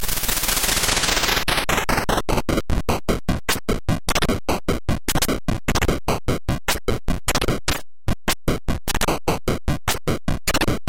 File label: noise jam